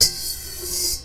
BRUSH BOWL, PERC METALIC; Rarefaction; Poke in The Ear With A Sharp Stick

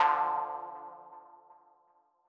M Mono DBeat D3 V127; KG MegaSaur

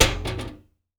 Cooker Lid, household rhythm samples Hits

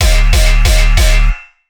gun, bass

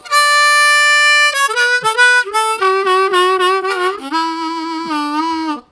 Bright, Harmonica Riffs; Zero G - Harmonica

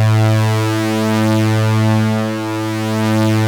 OB A3 -L, Rare Synthesizer; Hollywood Edge - Giorgio Moroder Rare Synthesizer Collection; OB 4 VOX.

